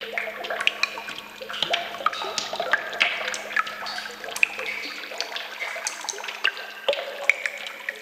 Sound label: water dripping loop; ninjago